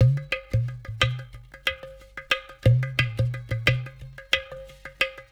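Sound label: Ghatam, World Groove; Ambient Grooves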